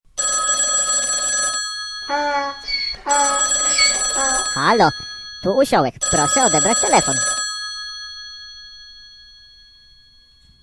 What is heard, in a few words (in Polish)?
dzwonek